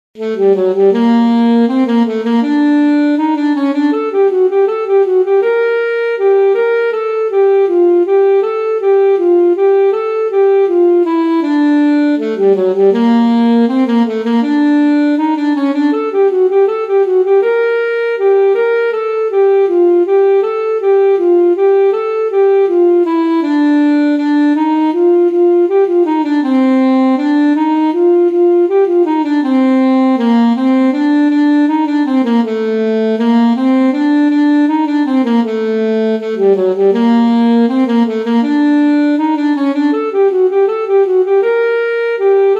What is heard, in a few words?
alto saxophone; rondo alla turca